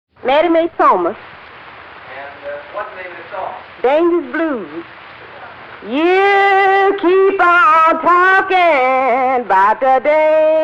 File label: Blues